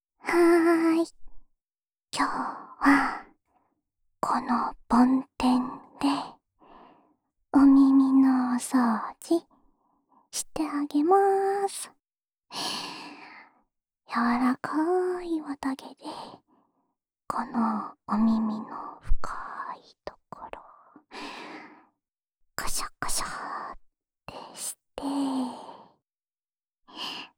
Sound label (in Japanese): 安眠用梵天耳かき（右耳）, ASMR